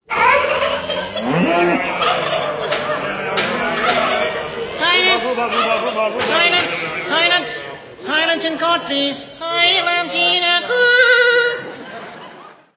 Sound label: silence